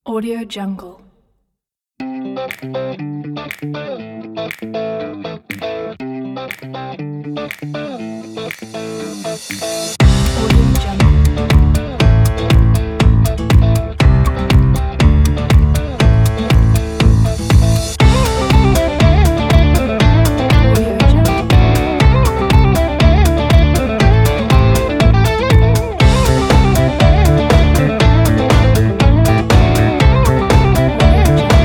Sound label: rock